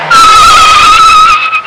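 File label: squeal, Transport; Sound Library 2000; Wayzata Technology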